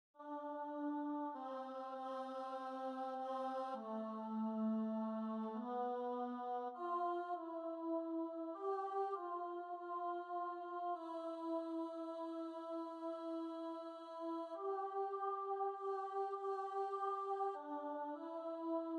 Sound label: choir